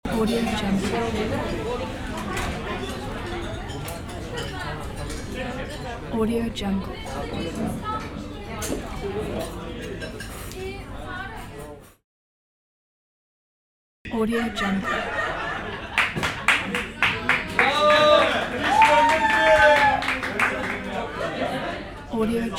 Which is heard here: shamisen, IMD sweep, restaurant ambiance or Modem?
restaurant ambiance